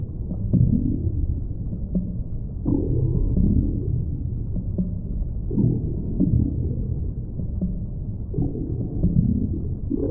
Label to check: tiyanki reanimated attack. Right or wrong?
Right